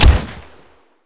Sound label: Automatic MK 19 End, Weapons; DCS